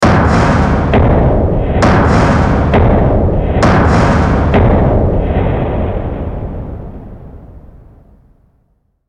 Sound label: dark percu